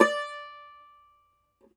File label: cumbus saz D, plucked strings; E-MU Producer Series Vol. 7 – Old World Instruments